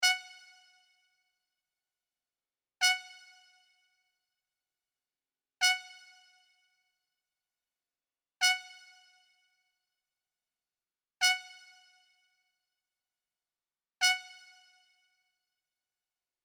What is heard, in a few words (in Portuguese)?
amostra de pulsos com periodicidade